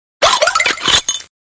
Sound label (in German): Ruf